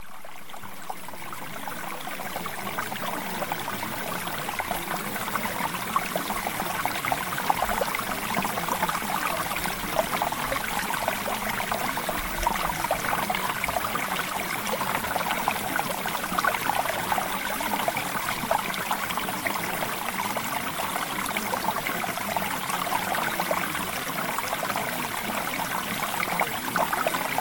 Mountain Stream; Premium Subliminals

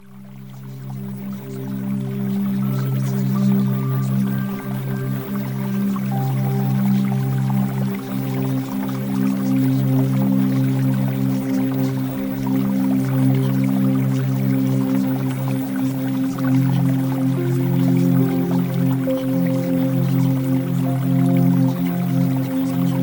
Music Sample

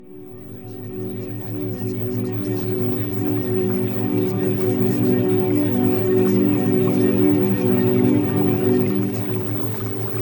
Music Theta Waves; Premium Subliminals; Success With Men

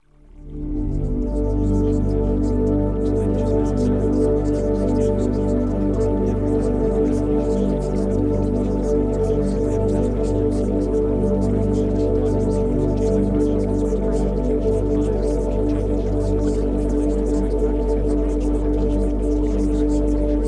Music

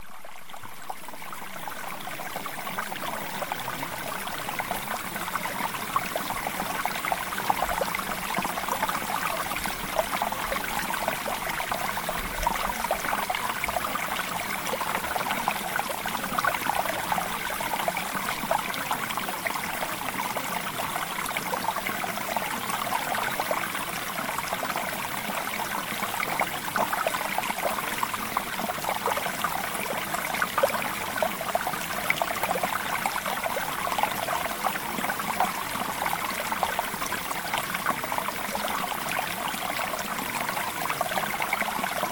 Mountain Stream; Premium Subliminals

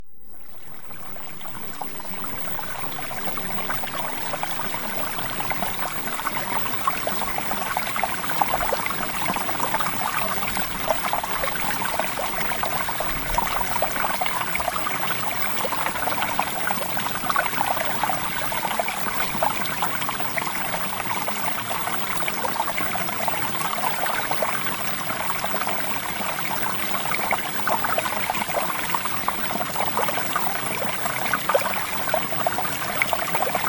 Water; Premium Subliminals

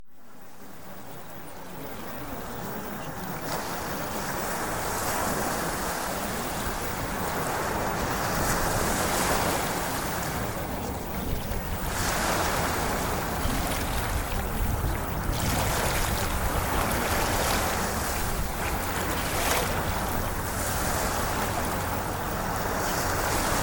Ocean Waves; Premium Subliminals; Success With Men

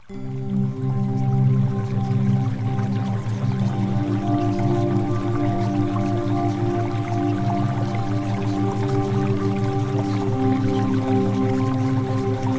Music Theta Waves; Premium Subliminals

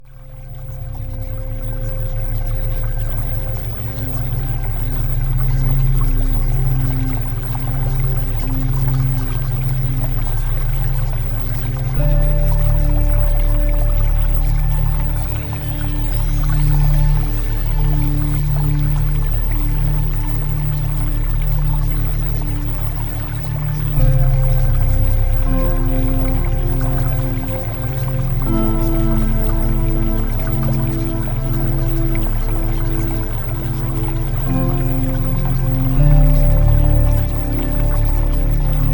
Music Theta Waves; EMOTIONAL HEALING; Premium Subliminals; Emotional Mastery

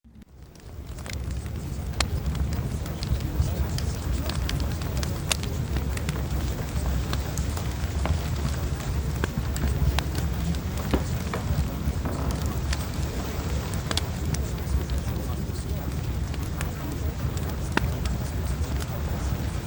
Fireplace; Travel Adventure

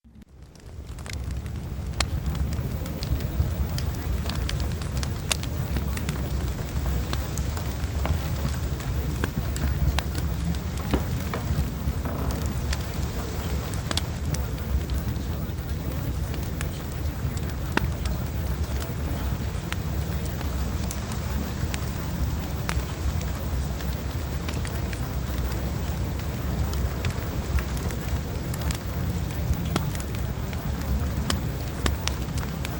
Fireplace; PERSONAL DEVELOPMENT; Premium Subliminals

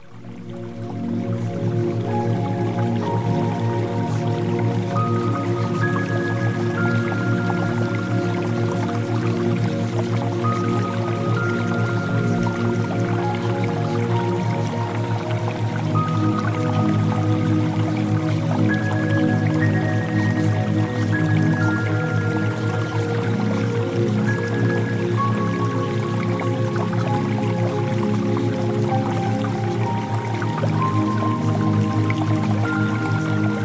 Music Theta Waves

